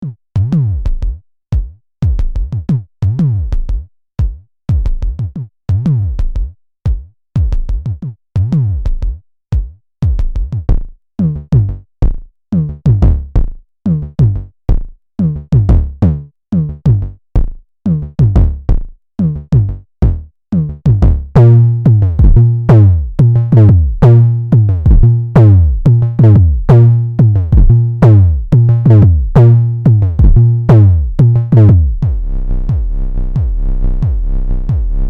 Hexinverter Mutant BD9 Modulation

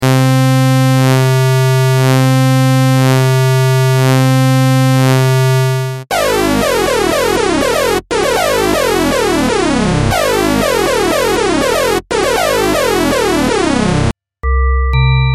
odd even sync organ discrete

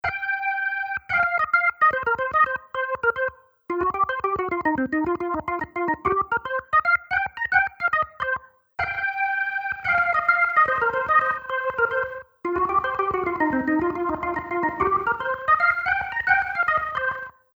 Organ Chorus

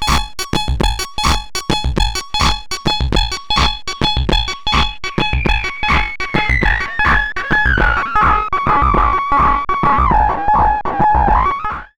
SOMA ROAT Loop plus Filter

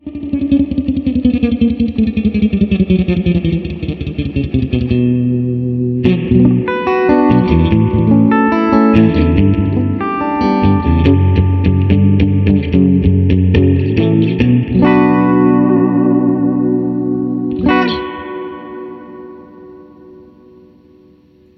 Surf

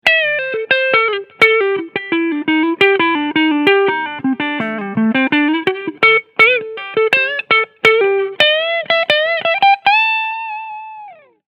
Fender AV II 75 TELE DELUXE Lick Clean Front PU

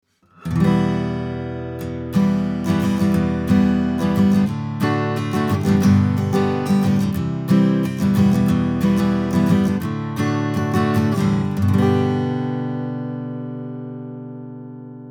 Acoustic Git OC818 preamp only HPF 80HZ

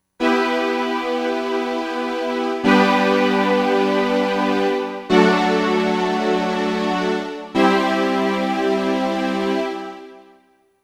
Strings Ensemble; MPK Mini Play

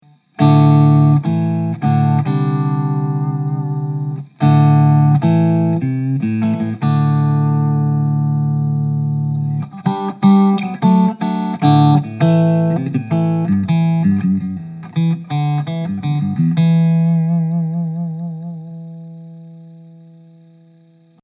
VOX MV50 Boutique gain stratstellung

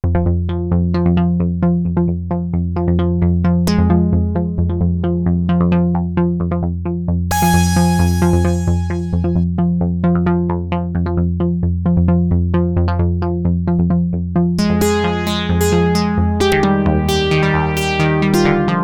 arp; ambika; autechrian

